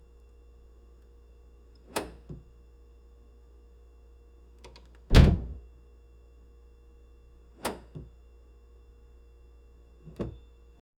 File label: double door refrigerator, appliances